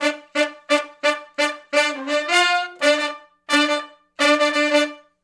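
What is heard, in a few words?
Funk Riff (D) unison, Horncraft for R&B; Sections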